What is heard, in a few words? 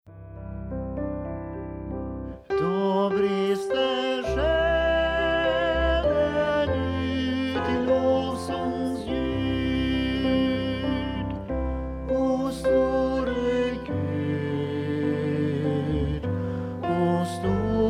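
SATB tenor; O store Gud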